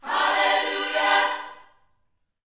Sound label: YOUTH CH PHRASE, Vocal Planet Gospel